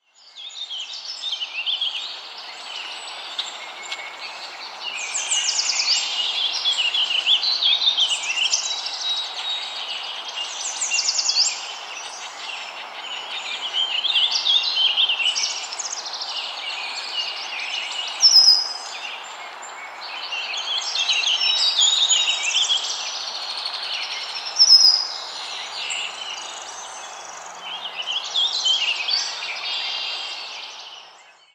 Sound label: warbler ringtone